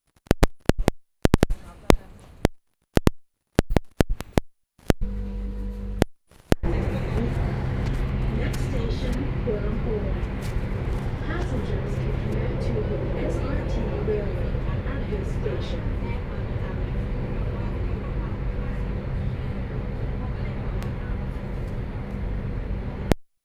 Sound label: Bangkok broken recording, fieldrecording